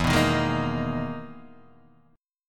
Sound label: E Major Sharp 11th E, Guitar, Open D; chord shape